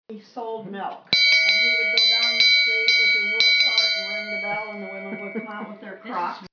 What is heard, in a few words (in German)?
quincy grundrauschen